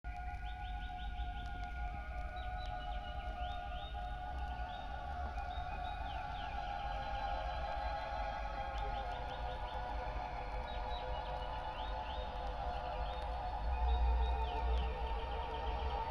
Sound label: classica